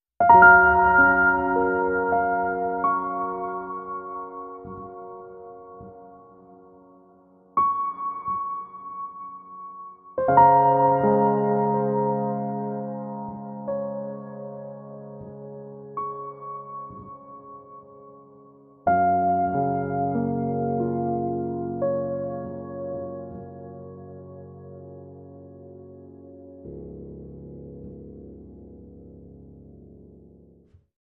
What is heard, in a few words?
Ethereal Piano